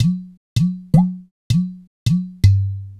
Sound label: Udu